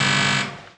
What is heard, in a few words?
Warning Message